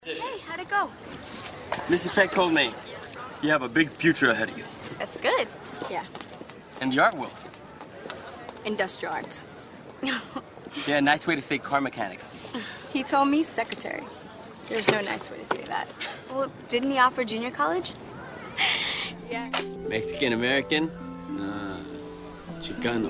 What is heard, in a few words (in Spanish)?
Sonidos